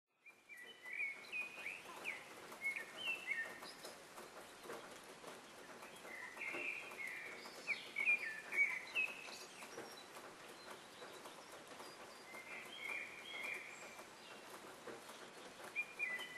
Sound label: Morning Birds